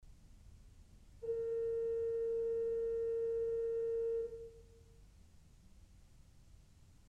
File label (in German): Principal 4' ais, ORGEL pipes